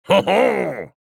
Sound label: Laugh; Redux; Age of Empires III - Complete Collection; Microsoft Studios